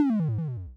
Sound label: lose